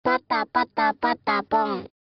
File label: patapon chant